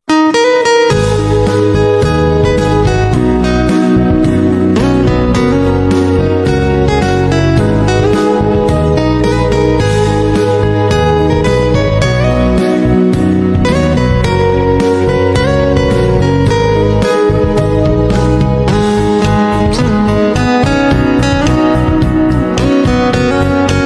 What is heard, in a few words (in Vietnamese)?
Guitar Khong Loi